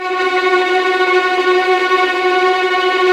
STR TREM, Vlns Tremolo

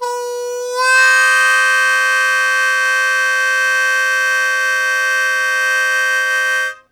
Blues Chord Attack F1, Harmonica; Zero G

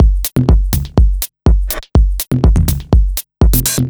D 123bpm, House Drumloops; Zero-G House Fabrik Drumloops

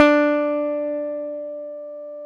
D 3 JAZZ GTR; Univers Sons; Jazzistic

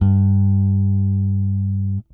Whole Notes G, Bass; Bass X

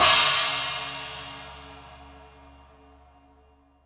20 China, crash cymbal

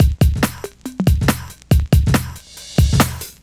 Break Beats, 140 BPM; Vengeance - Essential Clubsounds Vol.4

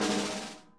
SNARE, Interactive Drum Kits

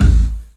LEDRMK, 3D Drums, LESLIE KICK

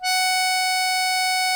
ACCORDION F#4; InVision Interactive; Lightware VOL-1; Instruments & Percussions

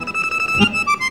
ACC TRILLER; Best Service; World Colours

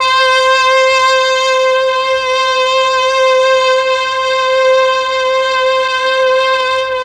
MEL STR, Legendary M400; ILIO; Propeller Island

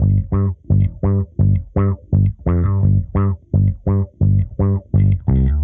Bass, 85bpm, G, dusty funk

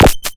Morse Coder Static Wobble; Cwejman S1; DevSnd Cwejman S1 - JK

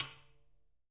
snare rim, JZ XSNARES; Sampleheads; Peter Erskine Living Drums

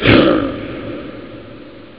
hah; cadaver; Amiga Soundtracker Sample Packs

